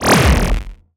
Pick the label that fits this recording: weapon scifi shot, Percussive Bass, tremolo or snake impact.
weapon scifi shot